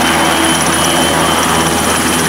nh90 engine, aircraft; Vehicles; civ14

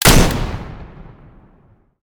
musket, Guns Fire

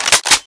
shotgun pump; Weapons; Guns Interact

